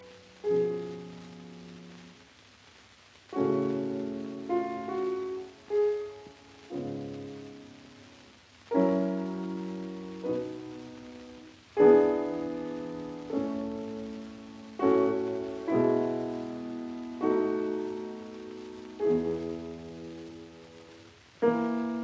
Piano Sonata in C major Adagio; Op 2 No 3; Claudio Arrau

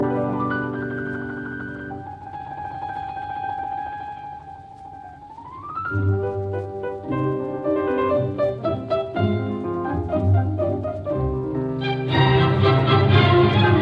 Piano Concerto in C minor Rondo Allegro; Op 37

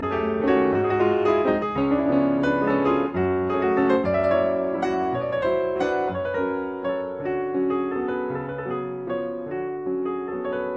Mazurka in F sharp minor; Mazurka No 38; Op 59 No 3; Paul Badura Skoda

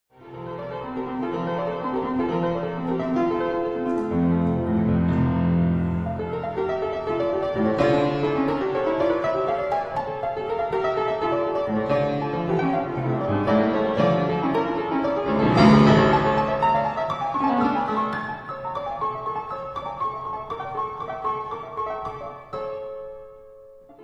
Keyboard Sonata in D minor, Allegretto con moto; Op. 63; first English edition

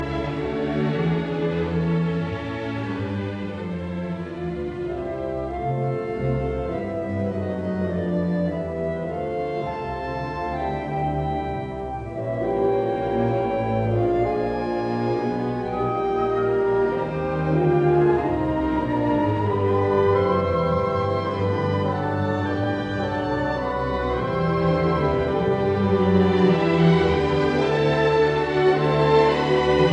Piano Concerto Allegro dolce e solenne; Op 39; Prologo e Introito

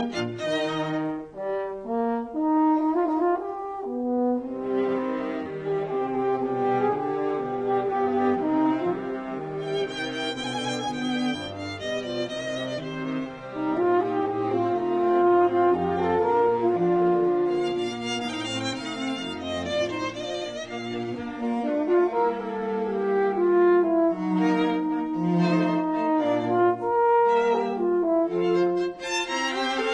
Horn Quintet Allegro; in E flat major; Mozart; K 407

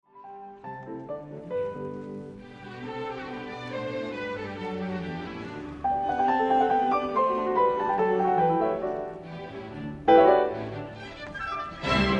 Piano Concerto in G major Rondo Vivace

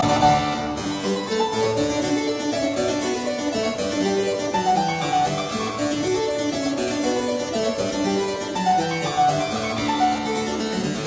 Keyboard Sonata in G major; K 427

